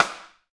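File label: snare drum, Real Kit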